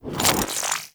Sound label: Spear Throw Charge; Warframe; Corpus; Spear Gun